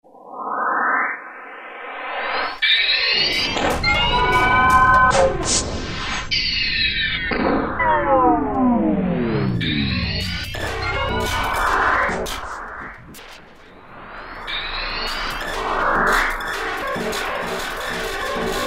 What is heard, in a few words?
Alien Industry, Kurzweil K2000; Kurzweil K2000 V3